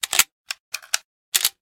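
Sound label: weapon machine jam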